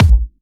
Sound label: Hard Kicks; Vengeance Samples